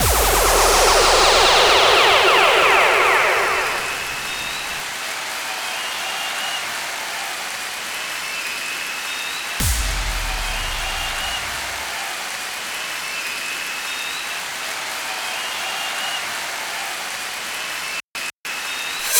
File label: bgm